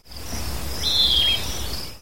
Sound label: Western Wood Pewee; Cone Mtn BBS